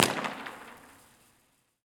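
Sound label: Academic Quadrangle, Impulse Response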